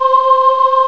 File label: Choir Aahs (C4); X-Wing; X-Wing Patches